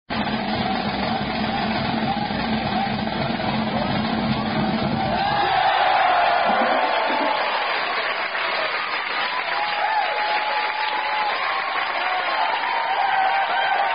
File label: Band Cheer Unveiling Marching Band Chant Cheer; Purdue Band Cheer Unveiling